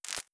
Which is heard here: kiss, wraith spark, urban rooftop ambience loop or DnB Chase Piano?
wraith spark